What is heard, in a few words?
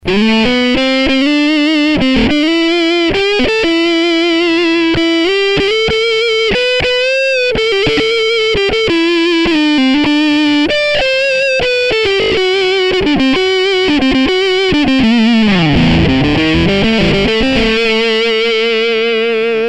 Fuzz